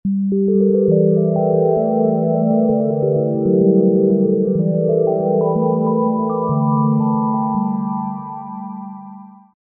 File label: Like Piano, Roland V-Synth; Factory Patches